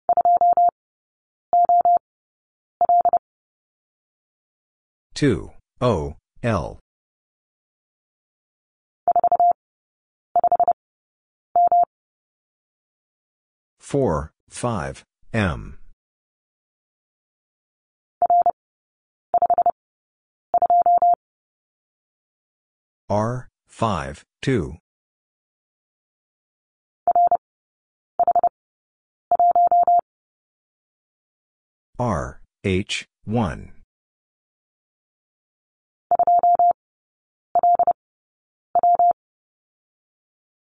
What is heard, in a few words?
Letter w Three Characters 30wpm; Lesson 19e